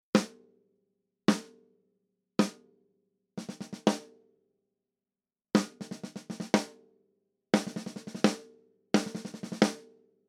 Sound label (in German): Snare Drum Mikrofon; Sib Rocket One